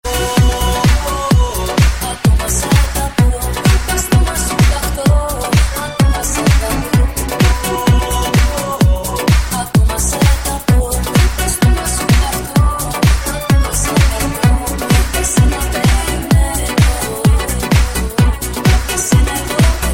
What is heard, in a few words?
Great Mix